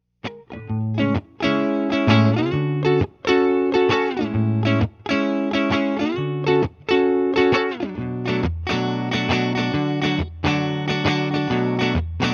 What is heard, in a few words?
guitar close distant, Close vs distant miking; Mixing for God audio examples